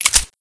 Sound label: p228 slide pull; czero opforce